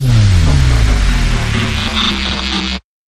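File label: Power Down; PwDn Cutting Edge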